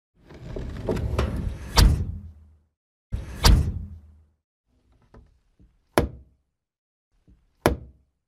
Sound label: Coffin